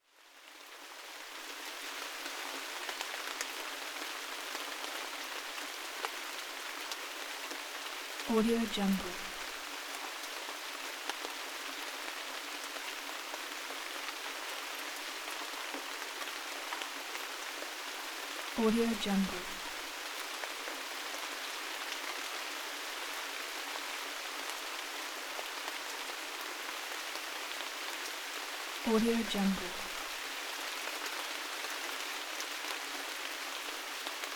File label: rain